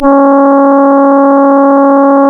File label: C#, Synclav FM, BRASS; Big Fish Audio